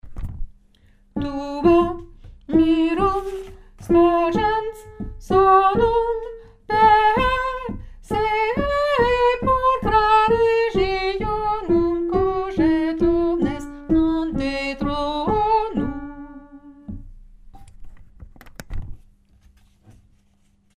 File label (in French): numero 2 lettre D tenor